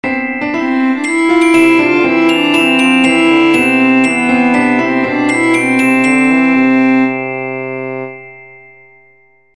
melodie

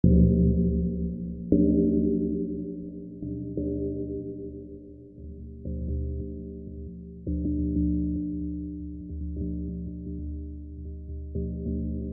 kugel teller resampling

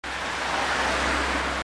Lkw

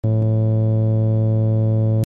saegezahn 110, U

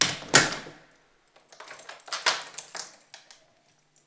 tuer

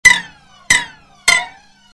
hammerschlaege flanger

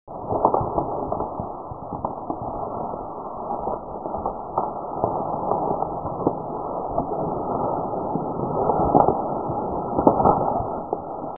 papier resampling

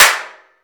toilette

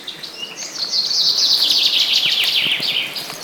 vogelzwitschern

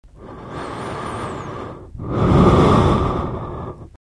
atem

